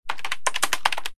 keyboard; trash collection

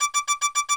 heat shields alarm, ships; CosmicRage

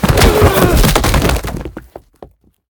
tackle; CosmicRage

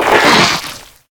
vacus hit; combat; enemy

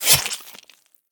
flesh, combat, weapons, swingable; default swingable

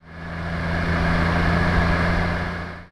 overtake, highway, old car

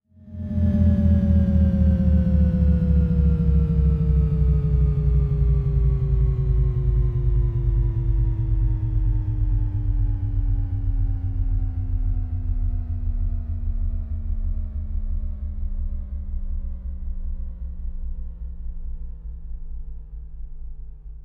power off, ships; Cosmic Rage; ships deepspacerepair

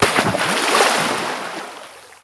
water, combat armor

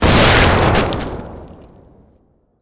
flesh; combat; weapons; rocket

